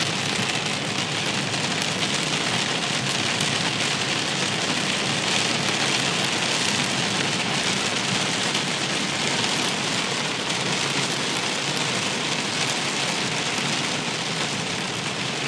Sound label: driving in super heavy rain